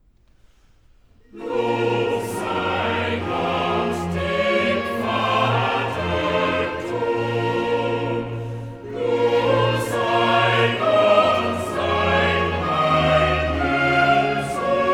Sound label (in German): Choral (Duetto); BWV 62 'Nun komm, der Heiden Heiland II'; VI. Choral (Duetto) 'Lob sei Gott, dem Vater; Bach; Köln; 1st Sun in Advent; Cantata